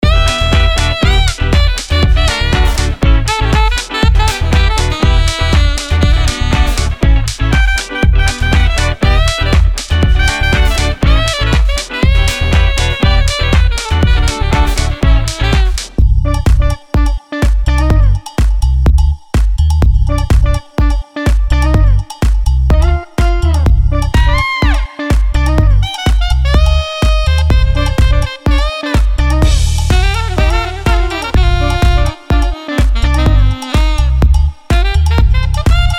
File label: Funk Components